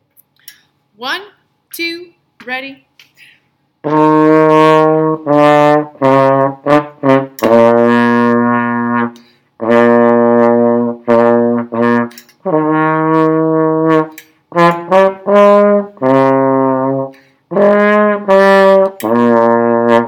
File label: trombone